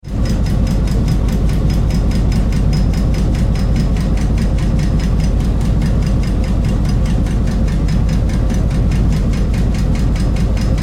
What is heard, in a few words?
Ship Engine Run and Stop; Tom Hutchings